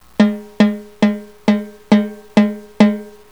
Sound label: plus quarter turn, banjo